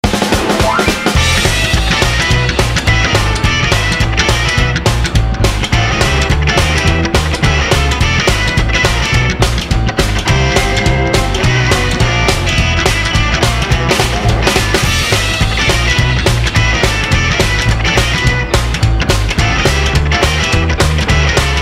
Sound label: Rockabilly; Hyppönen&Enbuske; tunnarit